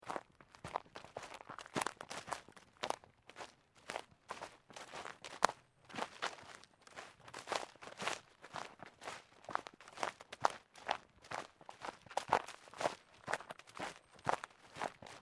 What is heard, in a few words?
FOOTSTEPS SNEAKERS UNDERBRUSH SEQUENCE; Footstep Foley; Human